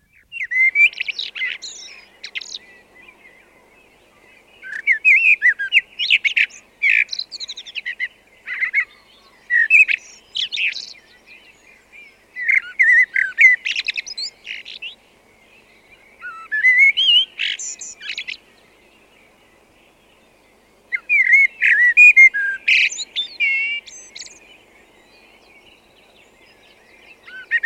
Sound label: blackbird, turdus merula song; bbc